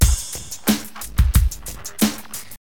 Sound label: LOOP, HipHop Drums; LiveAct Pool 1